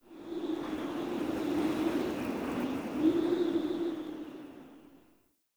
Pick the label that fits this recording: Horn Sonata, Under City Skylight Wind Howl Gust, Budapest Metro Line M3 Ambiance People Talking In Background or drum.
Under City Skylight Wind Howl Gust